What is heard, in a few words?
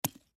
Lighter Drop Dirt; Drops; Foley; Blastwave